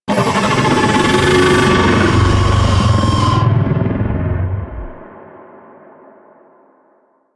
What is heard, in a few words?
Arachnoid Camper Vocal Death; Warframe; Corpus